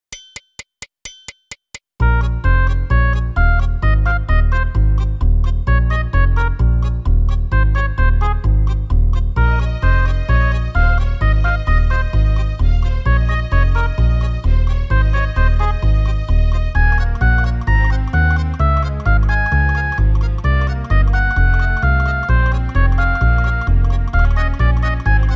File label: psr e373; yamaha; midi recordings; creative exercise